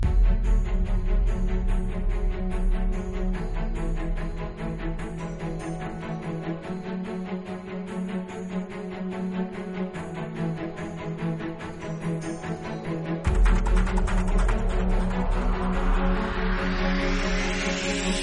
Music; GeoTombs

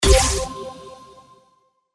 drop Sound; SpacePetsSkipCounting

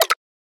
grab Sound; OnlineCoins

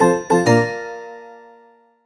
Level Complete; DragonDrop

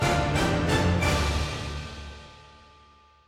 level Complete; ReadingRaiders

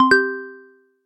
enter; WPM

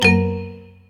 error; MQ2

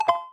pop Sound; CursiveLetters

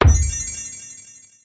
set Bomb; MQ2

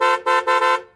car; ClassroomTimer

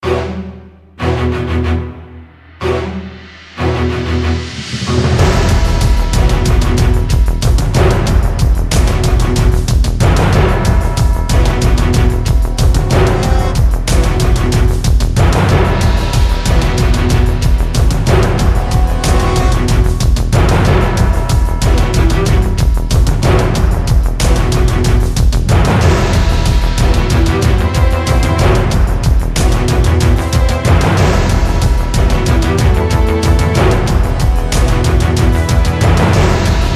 Invasion Music; SoundTracks; BattleBuddies

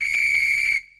whistle Sound; BattleBuddies

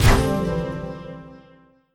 crystal Blue Warp; MQ2

